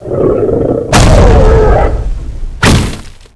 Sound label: attack quake; cstrike; Boss Angra Reks; angra zbs